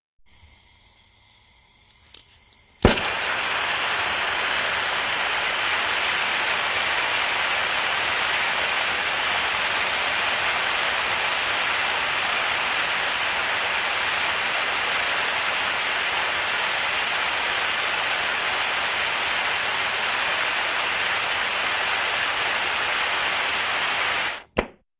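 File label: sound of a torch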